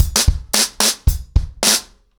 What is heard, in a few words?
110BPM, ACOUSTIC DRUMS; The Roots Drumloops